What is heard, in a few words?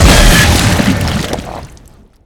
nest death; monster within; soundmorph